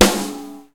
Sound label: normal hit clap; boop